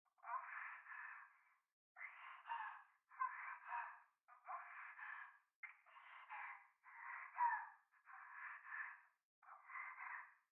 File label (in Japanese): トイレ 右耳密着処女喘ぎ